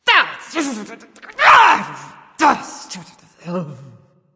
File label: grumbling; freya